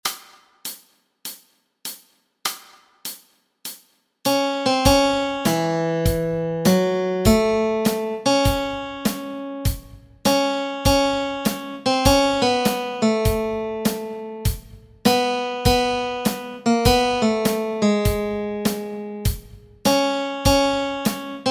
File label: melody